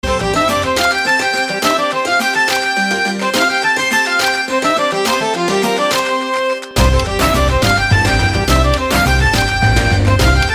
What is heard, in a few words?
english bgm ringtone